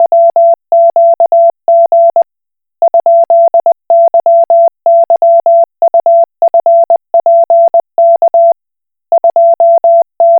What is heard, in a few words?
Koch Morse